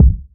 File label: studio kick drum sample a sharp key; kick drum samples